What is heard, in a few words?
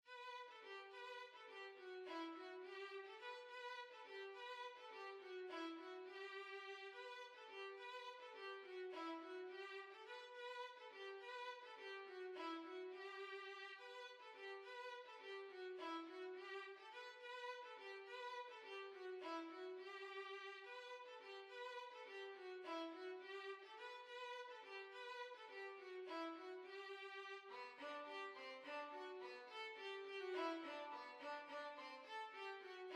Violin